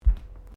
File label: Footsteps Bare feet Tiles Step; Footstep Foley; Footsteps (Sound Effects:Human)